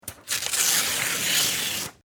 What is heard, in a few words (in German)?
office papier zerreissen